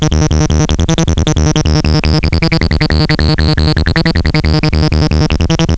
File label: FM acid